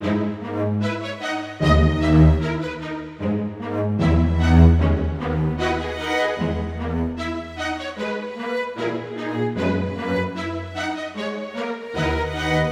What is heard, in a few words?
String Slow Cres